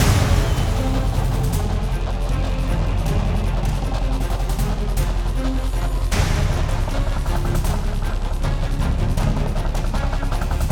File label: High Loop